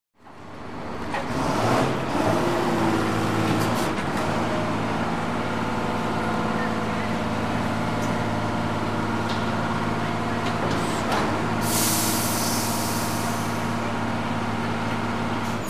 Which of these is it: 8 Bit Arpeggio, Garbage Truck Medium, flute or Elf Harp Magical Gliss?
Garbage Truck Medium